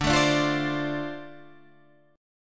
D-Minor 7th D Guitar Half Step Down, chord

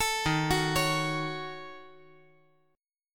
D sharp Minor 7th Flat 5th D sharp down; arpeggiated; JGuitar

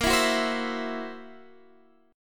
C 7th Flat 5th A sharp, chord

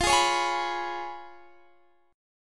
C sharp 7th F, chord

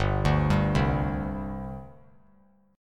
G Suspended 4th; Gsus4 arpeggiated; JGuitar

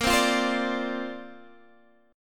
D-Minor 7th Bb, chord

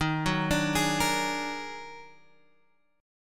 Eb Minor Major 11th, Guitar, Open G

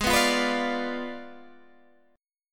Ab Diminished 7th Ab, Guitar, Open G; Abdim7; strummed; 18,16,15,16,15,15

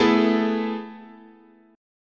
A Major 7th Suspended 2nd, chord, guitar

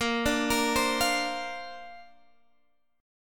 A sharp Major Add 9th, Guitar, Standard; arpeggiated; JGuitar

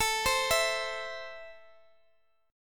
A Minor A down Guitar Open G

